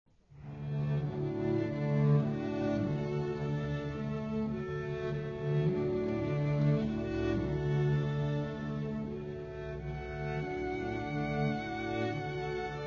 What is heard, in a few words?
Quartet meditation from thais; massenet